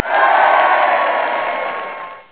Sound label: Applause; Darts